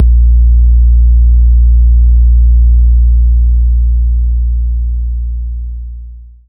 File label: 808 Kick, Roland JV-2080